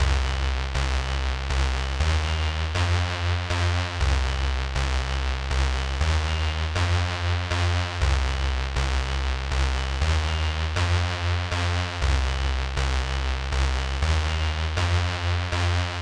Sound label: Bassline